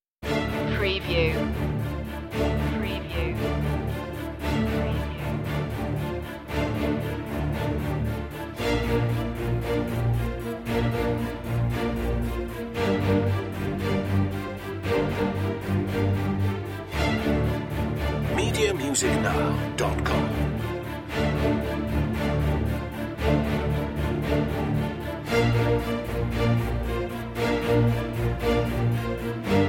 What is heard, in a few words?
strings mix high intensity